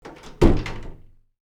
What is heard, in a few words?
Door close